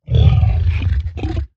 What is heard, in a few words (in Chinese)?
僵尸疣猪兽待机声